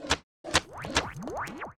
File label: sliders, tardis; p00gie